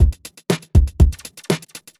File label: drums